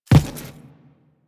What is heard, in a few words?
Footstep Default; Warframe; Railjack Crew; Steel Meridian